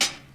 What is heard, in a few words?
old school mellow hip hop closed high hat sample g sharp key